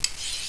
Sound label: Razor Starts to fall; Speed Bump